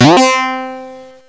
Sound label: SPRING; Sonic R; Windows